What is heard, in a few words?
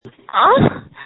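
Scream, phone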